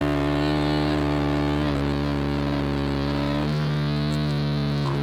mmmmmmmmmmmmmmmmm

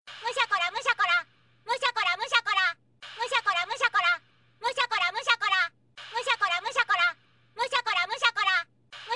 eating

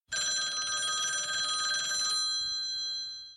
phone ring

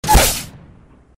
knockdown; apex legends

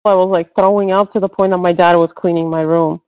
throwing up

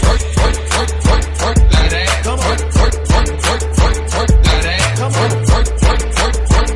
twerk

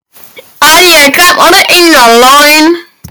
hihi; arya

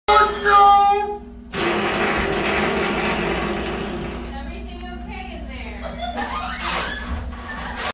oh no fart

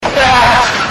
huahahaaha

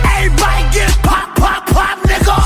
pop

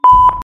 beep

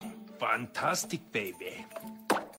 pop

